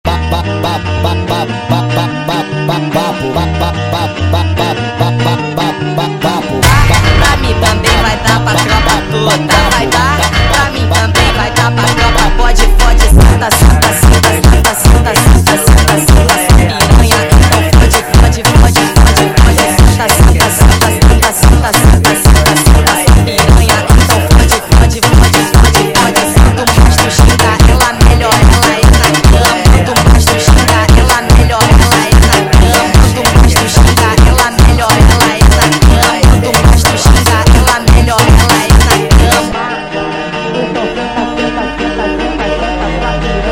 Funk sped Up

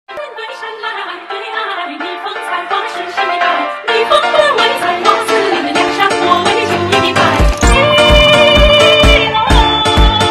Brazilian Funk

